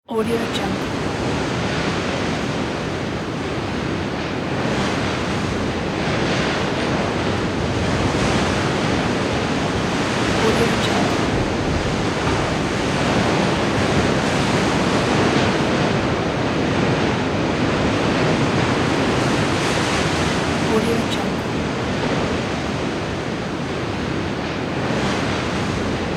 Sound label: strong wind